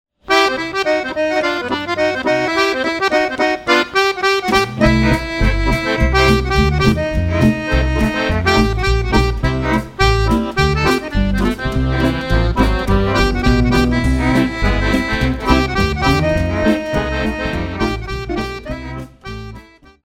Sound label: Accordion (Swing)